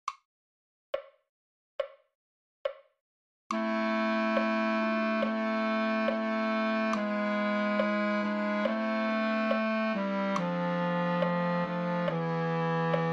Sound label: bass